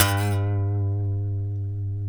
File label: A, funk guitars; Spectrasonics; Hans Zimmer Guitars VOL-1; TAKA FUNK